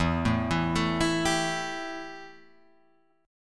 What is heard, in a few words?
E Minor Double Flat 5th E Guitar Open G